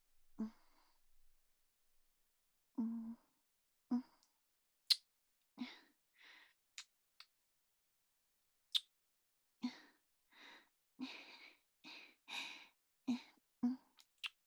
キス; SEあり

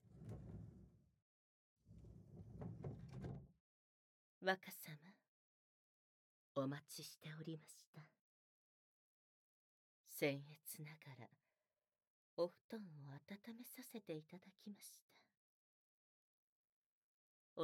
乳首責め＆耳舐め, ASMR; 蒸し蒸しのお布団の中でドスケベ; 添い寝でフルボッキ耐久

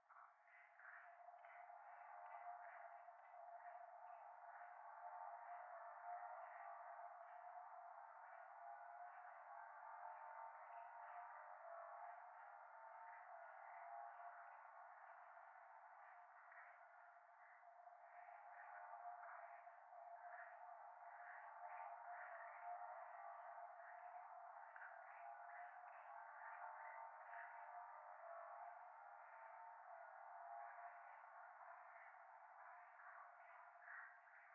夢の中 フェラチオ 車の中

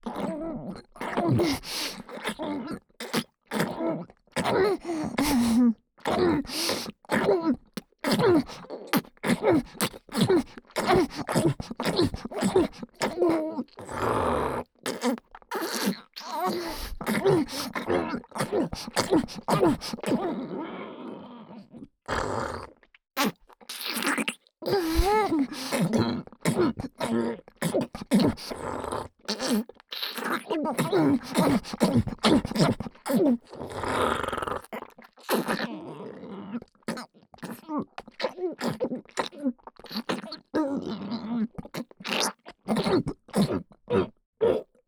ダウナー 喉奥右耳フェラ; フェラ素材

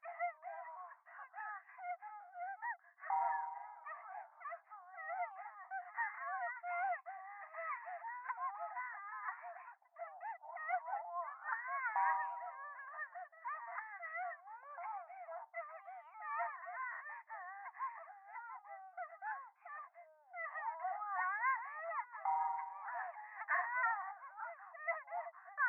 電話 喘ぎ 神社

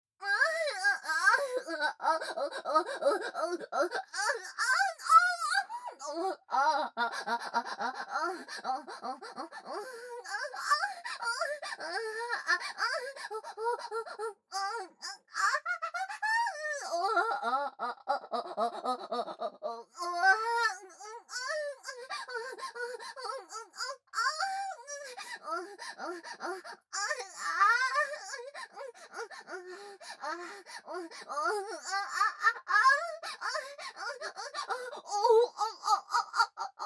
喘ぎ声・激しい・正面右下

